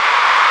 Soccer Game Ambience; J-League Soccer Prime Goal 3

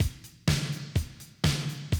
125, Classic Drum Loops

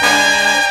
hit, brass

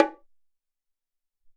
Bong Hi S, Alesis SR-18

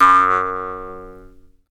JEWS HARP; Jew's Harp & Spoons

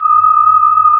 SCANNER, SIGNALS; AKAI Anime Sound FX

